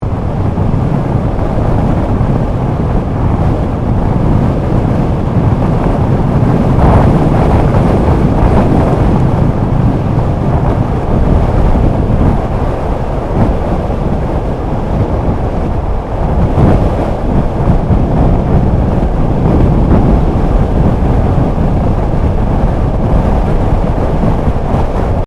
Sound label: Wind Air Movement From Speed, Air rush from car movement; Weather